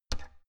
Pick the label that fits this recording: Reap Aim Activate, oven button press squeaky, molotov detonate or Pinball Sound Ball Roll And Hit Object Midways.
oven button press squeaky